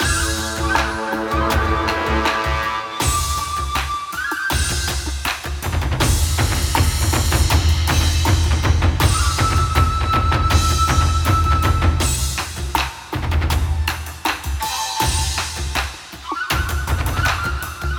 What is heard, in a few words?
TENSION LOOPABLE